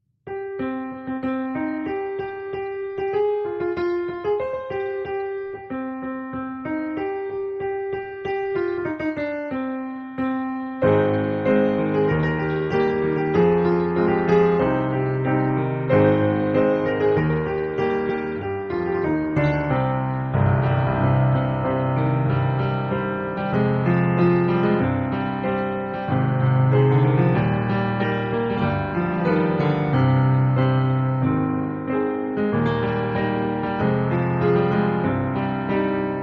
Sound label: sea shanty with lyrics piano cover